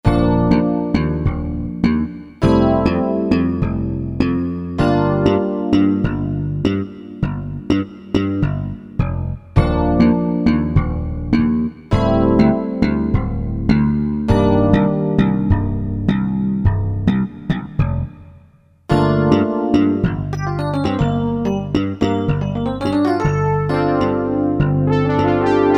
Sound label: FUSION SET; Fretted Insts 1